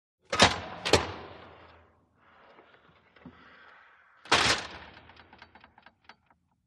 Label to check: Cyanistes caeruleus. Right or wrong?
Wrong